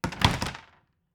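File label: door close quiet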